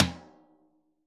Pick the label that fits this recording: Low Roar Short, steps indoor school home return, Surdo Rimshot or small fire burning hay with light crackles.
Surdo Rimshot